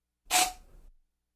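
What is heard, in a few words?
aerosol short spraying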